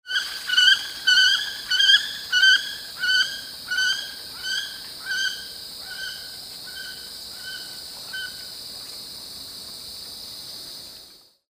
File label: wood duck aix sponsa